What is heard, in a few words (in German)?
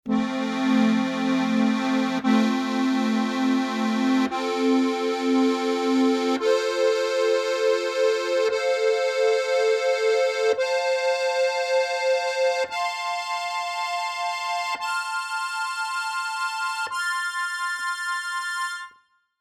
GCFB Akkord, harmonika